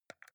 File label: console controller xbox arrow key press fast